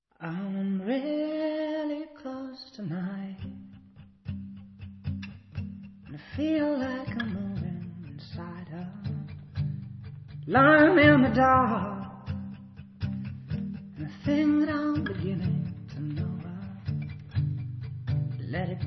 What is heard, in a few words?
acoustic